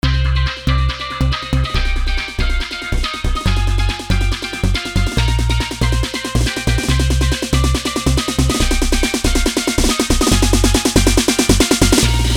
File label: DEsi Hard Bass Dance Remix